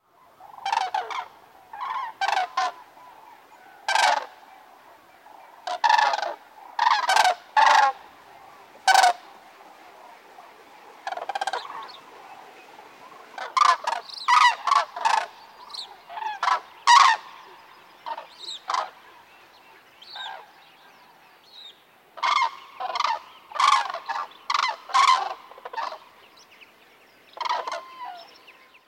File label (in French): Grue cendrée